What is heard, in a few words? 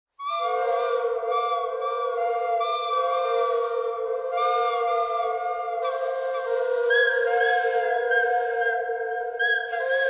bird canyon thread noise